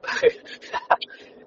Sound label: laughing